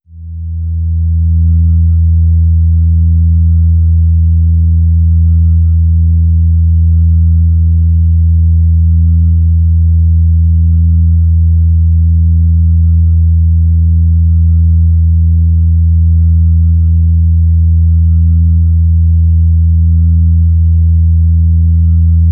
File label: organ tone e